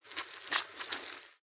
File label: dogtag; Kayne Ruse